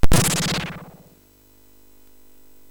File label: SFX, MOS6581; Narco Police; hvsc